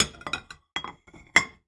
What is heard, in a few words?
Plates Put Away, Kitchen; 1001 Sound Effects